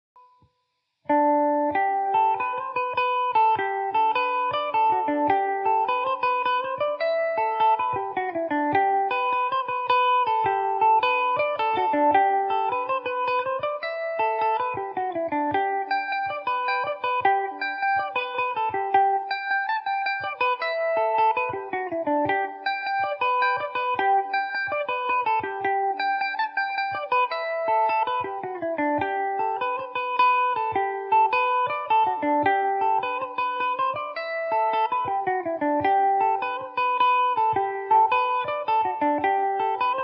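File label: G major, Irish mandolin; The High Road To Dublin; Revelation Jaguar electric mandolin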